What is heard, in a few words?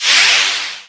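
Battle Skill; Etrian Odyssey III HD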